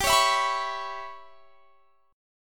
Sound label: G 6th Flat 5th; G6b5 strummed